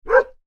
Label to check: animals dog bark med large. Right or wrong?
Right